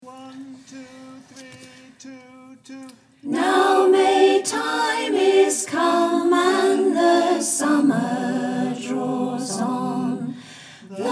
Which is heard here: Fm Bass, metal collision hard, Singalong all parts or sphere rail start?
Singalong all parts